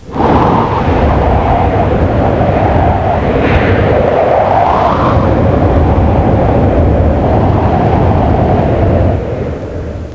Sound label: Wind